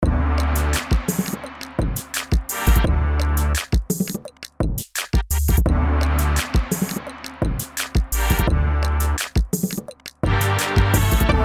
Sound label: hip-hop